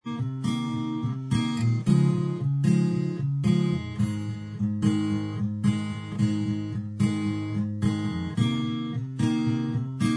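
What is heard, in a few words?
acoustic style instrumental, backing track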